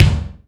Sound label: B.D., AMBIENCE KICK